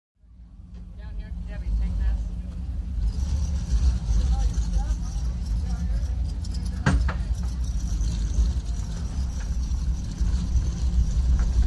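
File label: Power Boat Interior, off shore power boat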